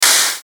Clap

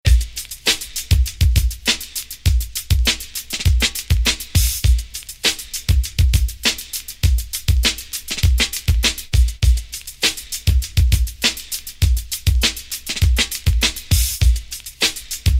Break

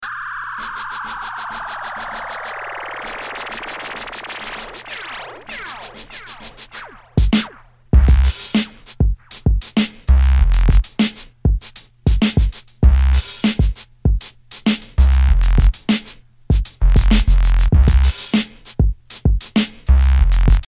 Break

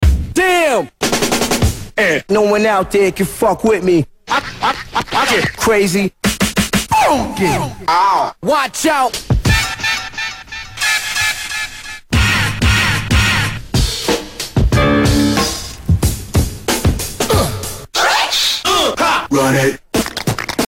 Scratch tools

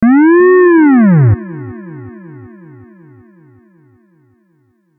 Siren

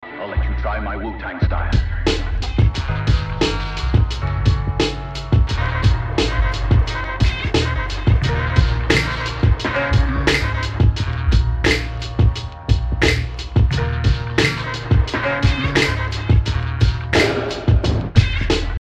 Break

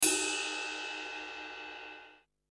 Cym

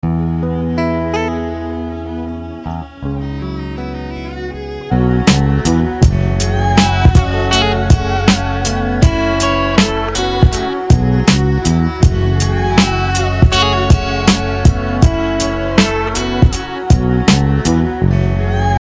Break

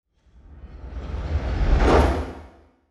Backwards fly by

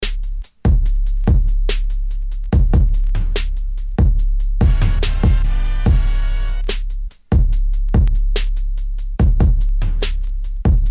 Break

